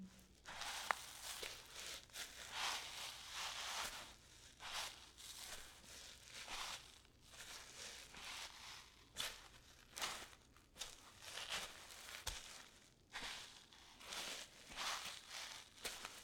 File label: Sand Barefeet Walking; footsteps